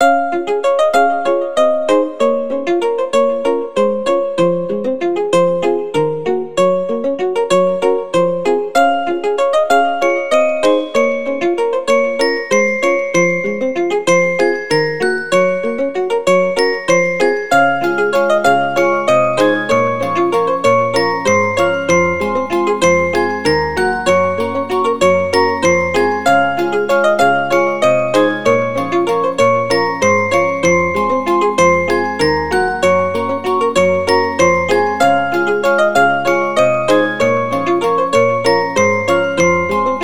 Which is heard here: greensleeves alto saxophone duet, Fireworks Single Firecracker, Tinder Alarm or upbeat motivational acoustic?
Tinder Alarm